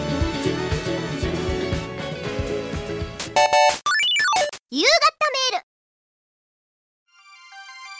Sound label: HONK HONK